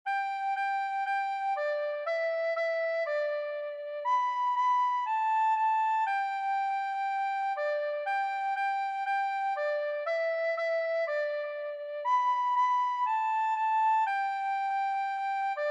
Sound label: Old MacDonald had a farm Recorder